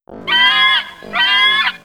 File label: wing chirp; triple-aaa-games; voidwing